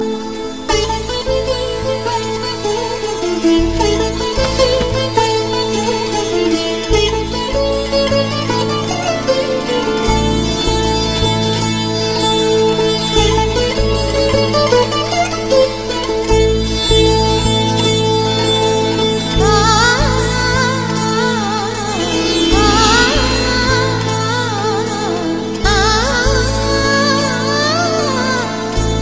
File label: Veena